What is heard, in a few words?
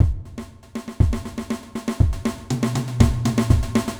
snare rush